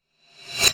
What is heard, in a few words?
Glassy Transition